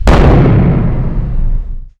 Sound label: huge distorted explosion